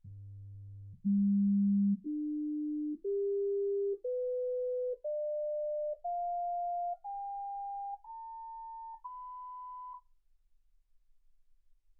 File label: sinus tones 48kHz